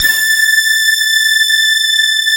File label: CMI PIANO